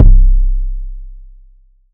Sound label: long bass drum single hit C key